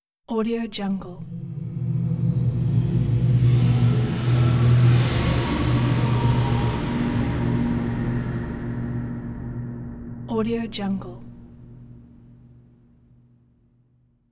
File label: airy deep celestial ambience